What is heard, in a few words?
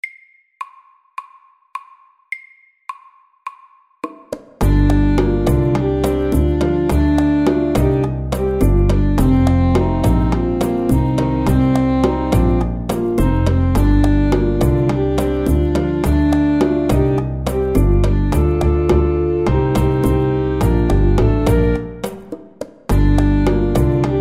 Driving saxes MIDDELS